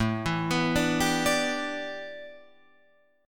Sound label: A 7th Suspended 2nd Suspended 4th A Guitar Open F, chord; A7sus2sus4 arpeggiated; 9,11,11,9,10,9